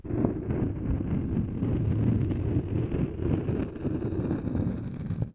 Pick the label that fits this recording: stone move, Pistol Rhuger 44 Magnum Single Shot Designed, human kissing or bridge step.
stone move